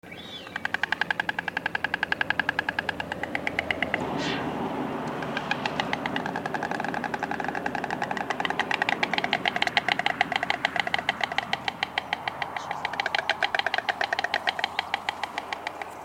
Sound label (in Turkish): Leylek